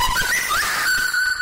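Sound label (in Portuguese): notificação estourada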